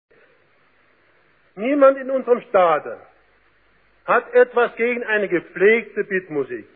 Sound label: beat